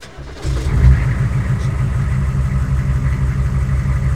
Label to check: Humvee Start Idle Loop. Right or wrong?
Right